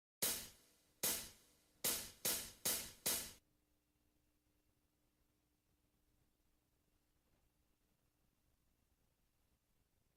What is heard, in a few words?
backing track, guitar backing track